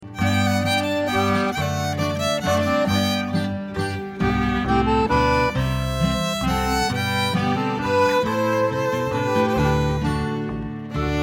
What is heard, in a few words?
waltz